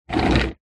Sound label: household radiator portable wheels roll very short wood floor